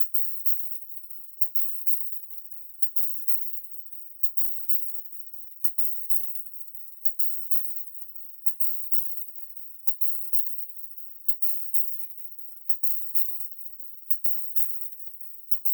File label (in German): Becken ab 16kHz